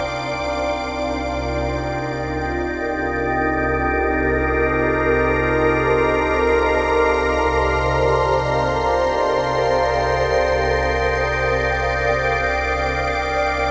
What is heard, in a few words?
Boss End Leadup